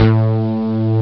d50 synth bass; ST-XX Amiga Soundtracker Sample Packs

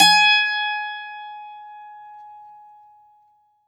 bouzouki; Best Service ProSamples vol.52 - World Instruments 2; AKAI

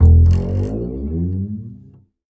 Upright Acoustic Bass, 1ST POSITION; Larry Seyer

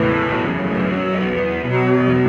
Electric Guitar; Sonic Foundry (Sony Creative Software) - Friendly Fire; Serpent

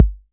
808 bass drum; Goldbaby Tape808 & Tape909; The Tape 808

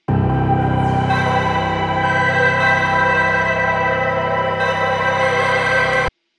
pad, c; M8

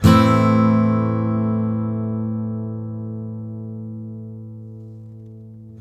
MIN A, Blues Guitar; ILIO; Fretworks

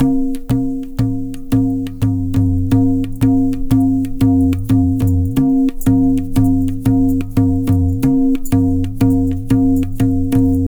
Pure Tabla, 090BPM; Zero-G

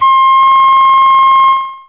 Flute C5; Fairlight CMI IIe

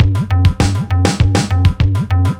drloop, drumloops, Vinyl Attack; Masterbits

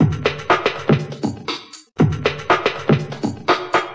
Jung Beat, Electronic Beats; Sonic Foundry (Sony Creative Software); Myths of Technology

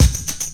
Beat Rhythm

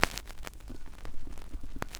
SCRCH NOZ, Record Noise

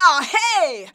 "ahey", shouts; Best Service; Hyper Dance

